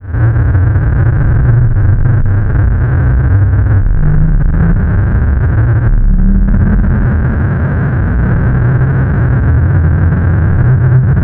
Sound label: DISTORDED, AMB FX; USB Soundscan vol.03 - Pure Electro; AKAI